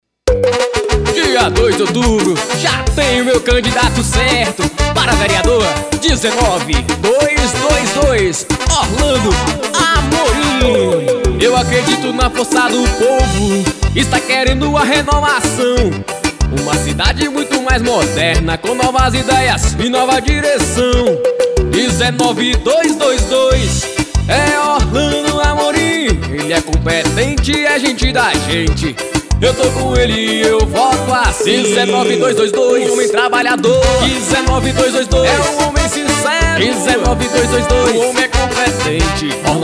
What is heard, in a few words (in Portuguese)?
jingles metralhadora; alves producoes